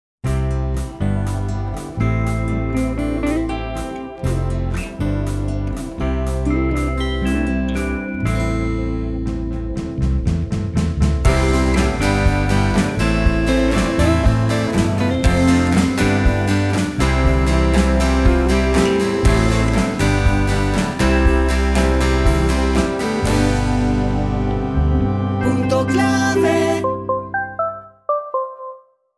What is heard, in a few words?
brandsong; puntoclave